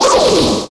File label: Sword Spin; Link